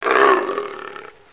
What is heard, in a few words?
leopard, bigcats